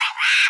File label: Monkey Shriek; Ed Edd n Eddy; Adromulus Pack